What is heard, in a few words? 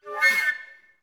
FL FX, Orchestra; Best Service; Peter Siedlaczek's Advanced Orchestra